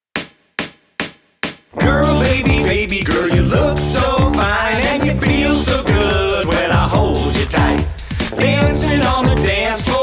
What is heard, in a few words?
Hip Hop